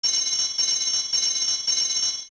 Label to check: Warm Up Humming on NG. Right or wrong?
Wrong